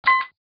phone dial

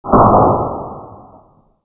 gunshot slow

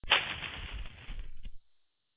branch

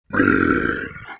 Wrong; Gargamen

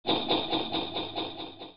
sleigh

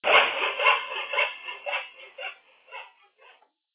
sawing

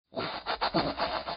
laugh; grinch

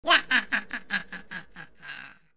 laugh; lepre

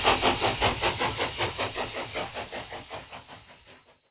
train sound fade

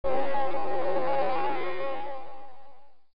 BEES

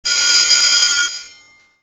doorbell

